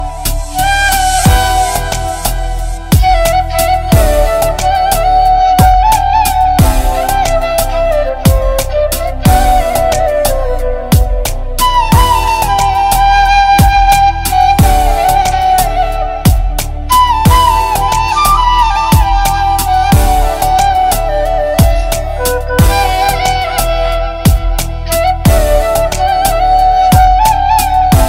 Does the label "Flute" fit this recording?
Yes